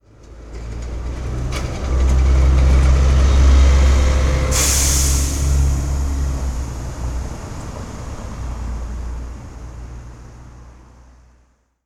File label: bus pass by